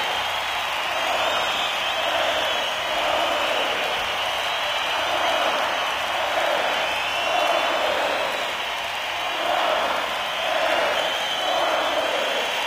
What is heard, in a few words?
crowd Ambience; netent